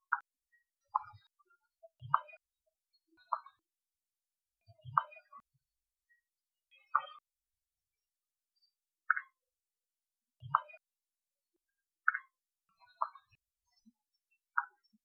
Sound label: ant pupa sound